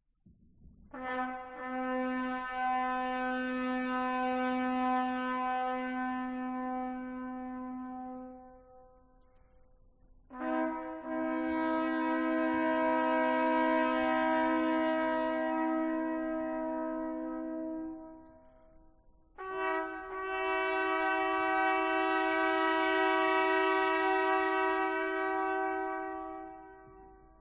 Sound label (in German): Aufzuege fuer Trompeten und Pauken, Largo; Antonio Salieri